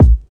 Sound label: round tr 505 kick a sharp key, tr 505 analog synth drum kick; kick drum samples